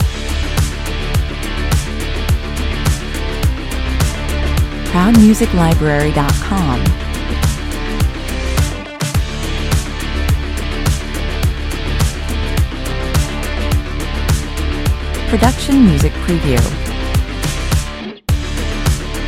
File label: loop